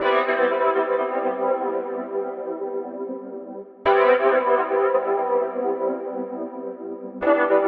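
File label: Whirlitzer, keyboard samples disco house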